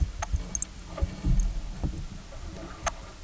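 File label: Brochis splendens, Sounds of fishes in the Peruvian Amazon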